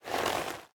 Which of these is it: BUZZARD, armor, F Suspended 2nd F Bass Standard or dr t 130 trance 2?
armor